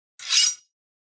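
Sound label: KATANA SWORD normal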